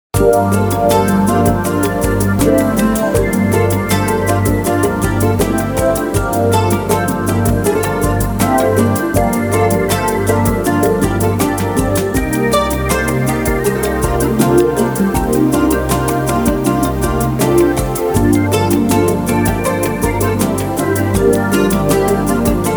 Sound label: Keyboards, Accordion; Year: 2016; Composer : Richard Hart; Performed by: Richard Hart – Keyboards, Accordion, Guitar, Ukulele, Bass Guitar, Drums